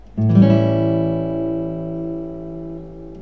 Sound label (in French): Accord LA7, guitare